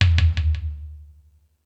Space Drums, E-MU Pro-Cussion; drum machine

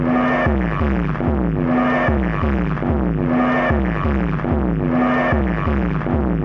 Crude Tape Loop, Tape delay; radiosonics

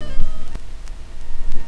Fx Vox; Equipped Music; Loungin House

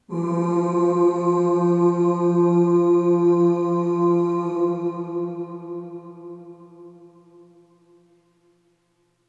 Ooh Long F2 Long Reverb; Harmonic Building Blocks

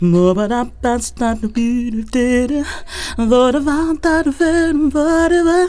FEM VOX, Hip Hop

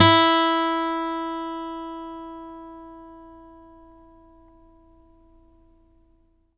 steel F E3, guitars; Synclavier Sampler Library

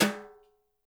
BRUSH TIMB; Spectrasonics; Liquid Grooves 1 & 2; BR.TIMBALES

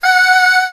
f#5, Choir, MIRAGE; ProSamples vol.55 - Retro Sampler; Best Service

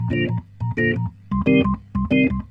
Roots Reggae, B-3; Sounds Good - Roots Reggae

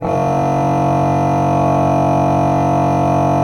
F1, Cathedral Organ, HOLZPRINZIP; Propeller Island

